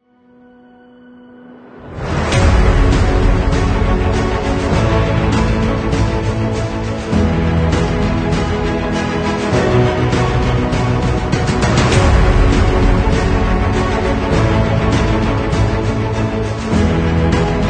Epic short

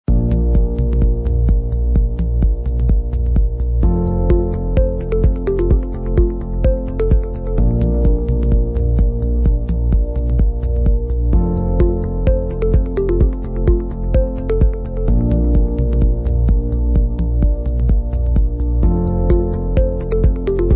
technology loop

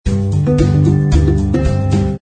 Shorter Phrase; 夏日温馨套装 Warm Summer Kit

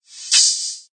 Percussion Open Hi Hat

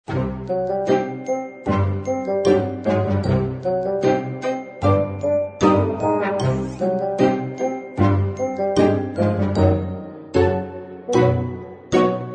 Comedy Fun loop

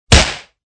Punch

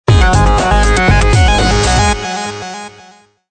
Electro Streak Logo without SFX short

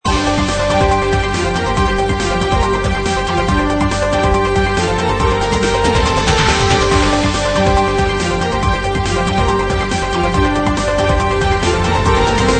Broadcast News loop

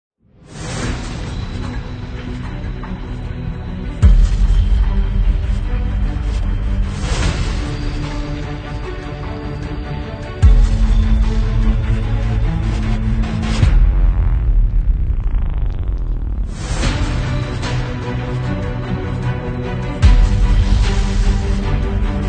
Logo Hybrid

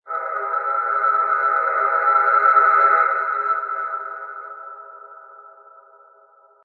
One Shot Whistle Scape C minor

